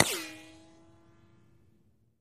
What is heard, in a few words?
Bullet Ricochet Sin